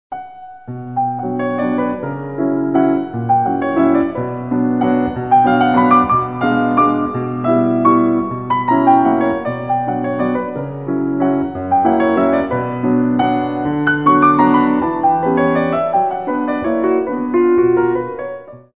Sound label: classical waltz ringtone; chopin